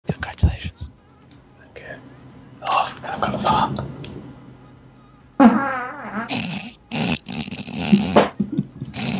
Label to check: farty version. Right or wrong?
Right